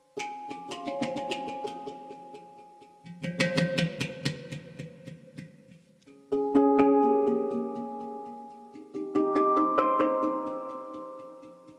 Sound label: Exotic Rain Hang Drum